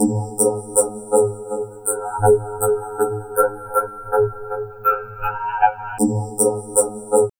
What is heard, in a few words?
VOCOD, Drum Loops Crazy Processed